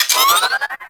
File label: Space Port Cargo Scanned Beep; Venus; Objective Rooms